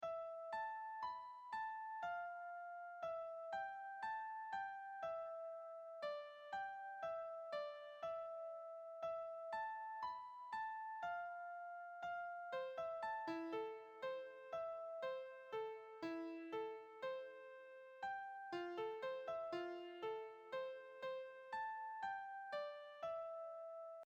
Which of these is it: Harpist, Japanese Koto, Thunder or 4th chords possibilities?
Japanese Koto